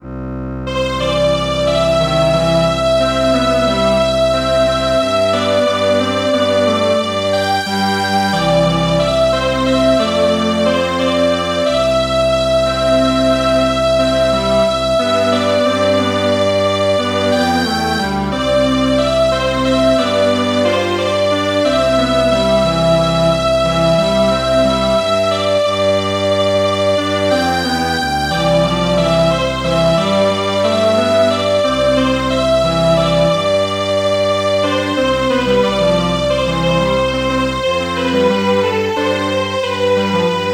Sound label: Yemen Song Strings Quartet, midi traditional